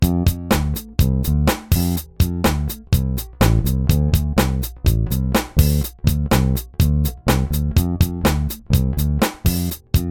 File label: yamaha bb2025x